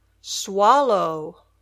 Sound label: swallow